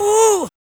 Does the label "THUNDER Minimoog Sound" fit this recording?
No